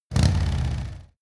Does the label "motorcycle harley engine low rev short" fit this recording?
Yes